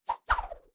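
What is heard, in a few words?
infantry sling, weapon; Battle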